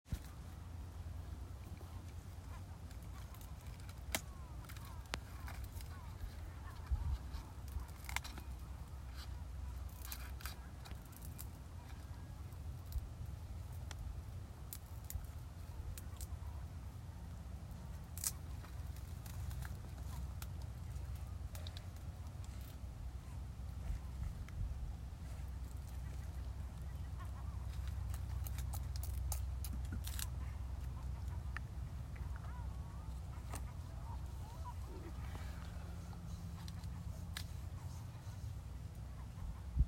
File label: soundscape; Raftis